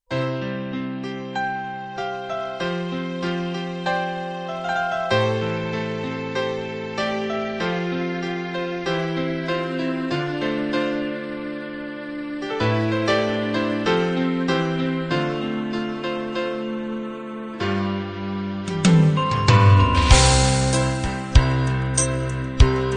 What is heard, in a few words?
love ballad intro